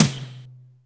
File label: rapier, toms; Real